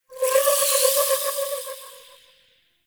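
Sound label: Gyromitra Shroom Projectile Whiz By; Warframe; Nokko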